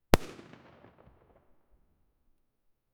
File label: fireworks to reveal the